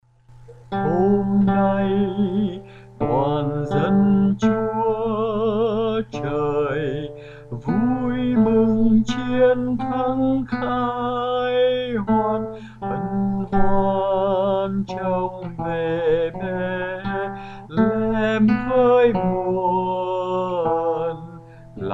Adeste Fideles Sop

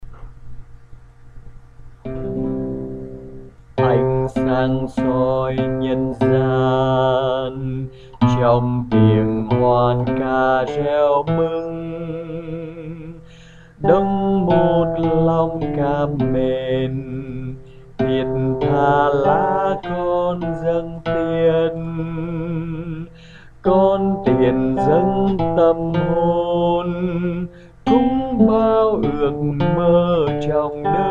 Bass

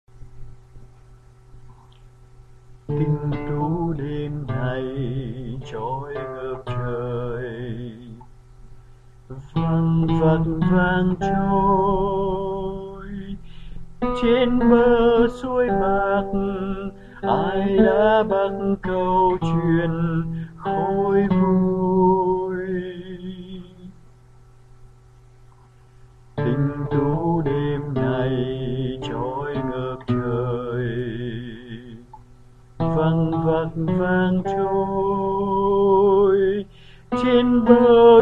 Alto

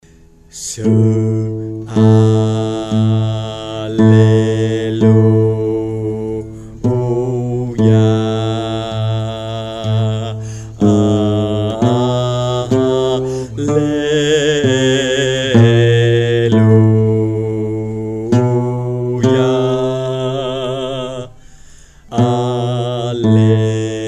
Alto

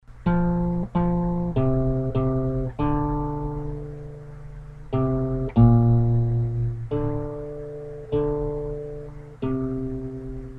Alto; Taize Ubi caritas

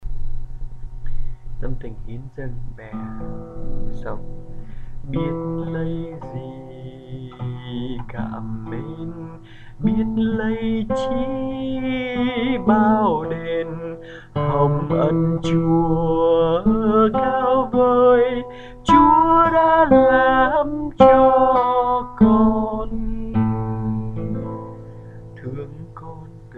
Soprano

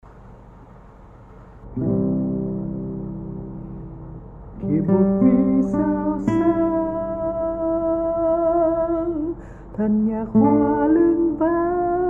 Tenor; tap cham

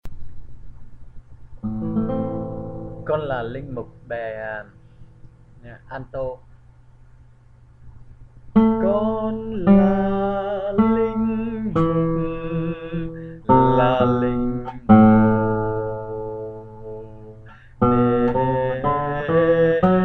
Alto

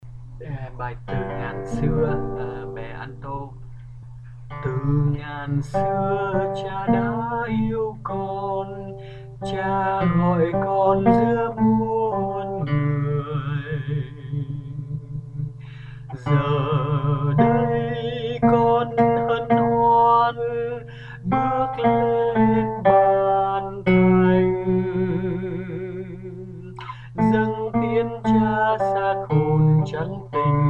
Alto

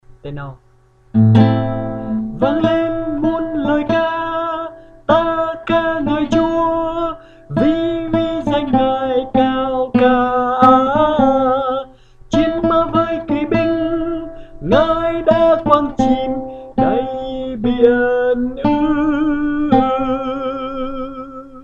tenor

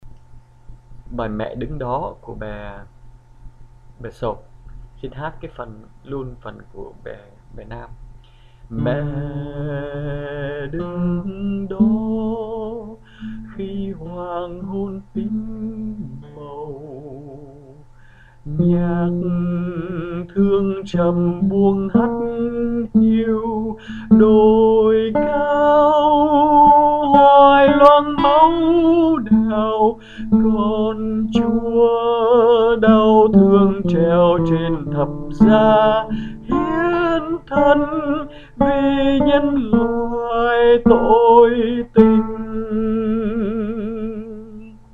soprano

